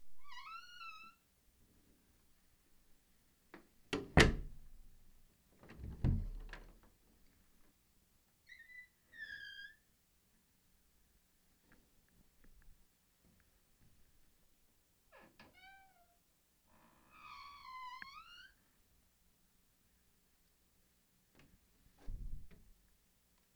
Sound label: Door Creak